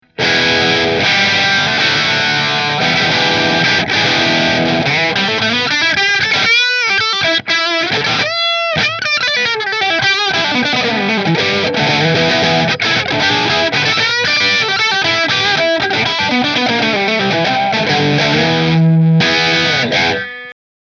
Greco Bridge Marshall, guitars; Greco Les Paul Custom White

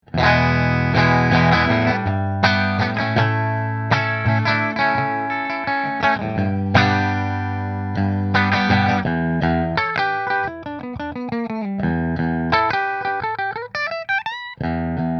Melancon Bridge Mini Position 2 Fender, guitars

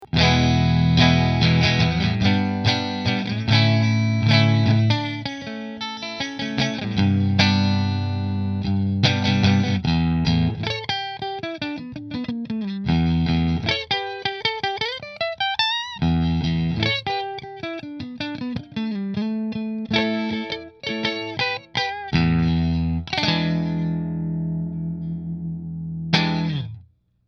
Silverado Position 3 Fender

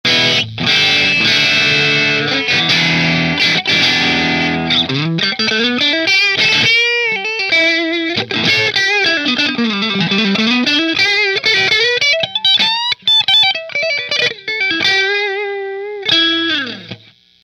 Strat Position 1 Through Marshall

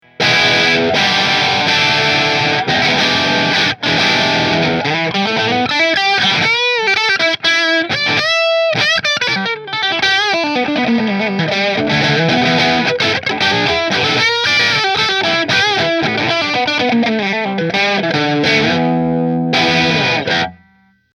JB14 Red Bridge Marshall; Nola

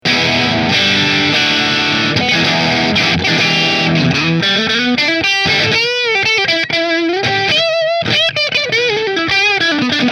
Middle Marshall; Heritage

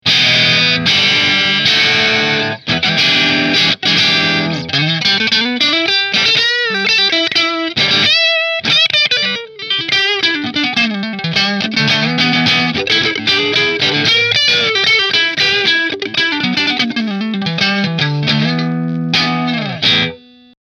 Melancon Neck Mini Position 2 Marshall

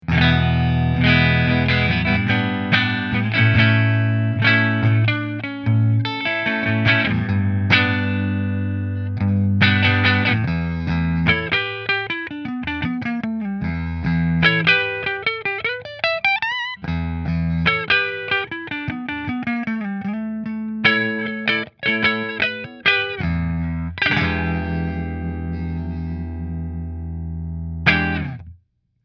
McNaught Neck Fender, guitars; McNaught Custom Guitars Vintage Single Cut Black Back; Orange

